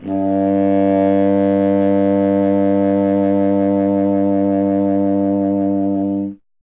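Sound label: wind contrabassoon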